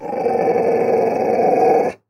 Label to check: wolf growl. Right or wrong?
Right